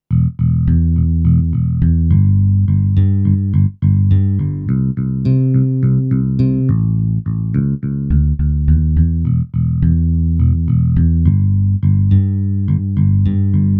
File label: pc361 audio bass ac; kurzweil pc361